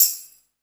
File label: PERC -L, 168 BPM Drum & Bass; Ueberschall; Drum & Bass Resonance